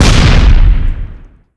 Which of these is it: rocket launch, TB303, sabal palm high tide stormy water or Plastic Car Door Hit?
rocket launch